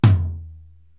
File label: Tom Lo, Korg TR-Rack